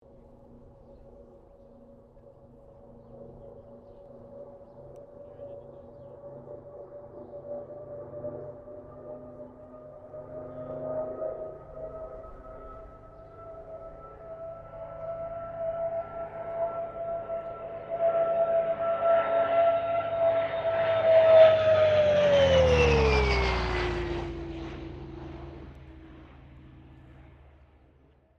AIRCRAFT CA-13 BOOMERANG PASS STEREO; Airplanes (Sound Effects:Vehicles)

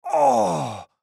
Human Moan; Moan Painful Short Male Fifty Yrs; Voice; Blastwave; Humans

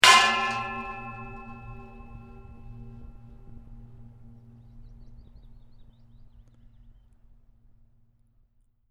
Metal Impact Hit Gong Like With Long Ring; Resonating metal pipe impact, long tail.; Metal Sound Effects

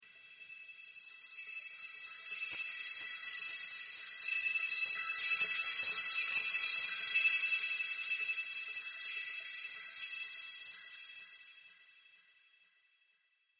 The Twilight Forest, scary soundscape; fantasy sound effect

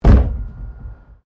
PLASTIC BARRICADE HIT; Material components for constructing more complex sounds

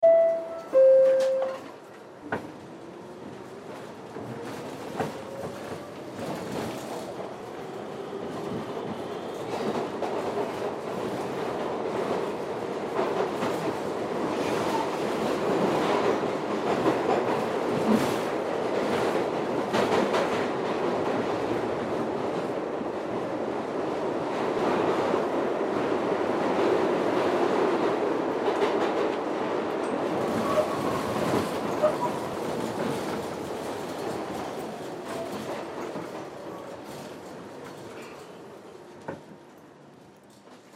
Subway Interior, Subway Interior Ride; Beeps; Subways

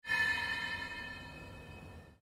Created Pulse Fade; Sound effects created by manipulating other sounds; Rises and Dives

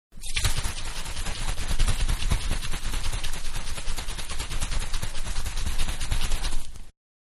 Can Shaking Liquid, Can Aerosol; Shaking (Sound Effects:Objects); Manhattan Production Music

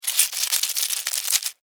Insect Move Wet, Insect, Movement, Wet, Bug; Sound Effects:Animals:Insects; Blastwave

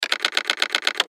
Button Switch Gear Multimedia; Web Interactive

